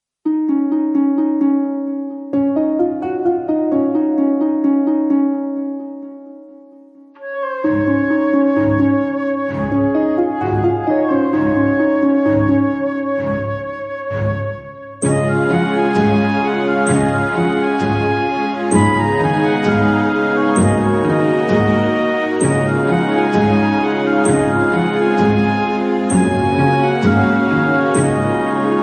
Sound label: Jana Gana Mana (Chorus)